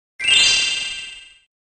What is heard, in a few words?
gem sound